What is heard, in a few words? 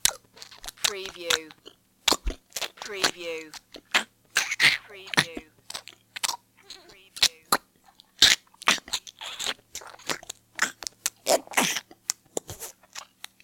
Monster eating flesh